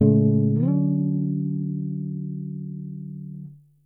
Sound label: guitar